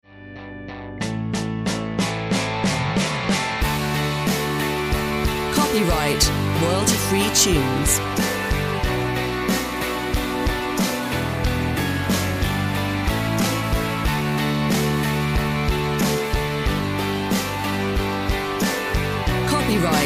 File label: Marching to Victory